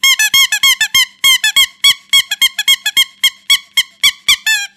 Bike Horn

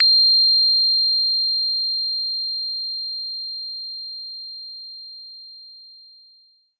bell instrument note; Multisampled Bell Instrument; WORLD

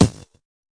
tight hit, Tight n Hard Kit; style kits

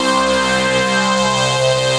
Synthesizer Middle C Notes, Lead; SYNTH KEYS ETCETERA

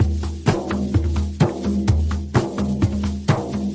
thuddy african beat, drum loops and breaks

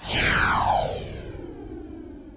Electro FX; Ueb HE Electro FX

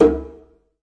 djembe big hit; ETHNIC and WORLD PERCUSSION

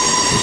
subway sound; Subway Sound Construction Kit

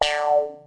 vintage cartoon boink; Cartoon Sound Effects; UNUSUAL KITS and FX

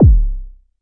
Arturia MiniBrute kk

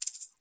rattle, ethnic and world percussion; Rattles

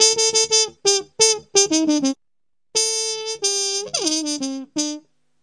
acid jazz trumpet

